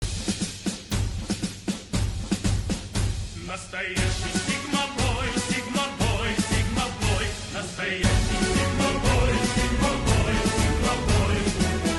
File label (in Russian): Военный хор